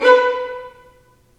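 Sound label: violins marcato, String Sections; Roland LCDP13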